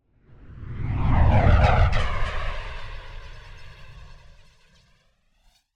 Jet By Processed - Passing Space Ship - Engine Whine; Hollywood Edge; sound designer's toolkit v2